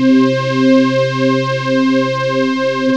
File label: PHAT RESO, SYNTH FUNK; Zero-G; Funky Elements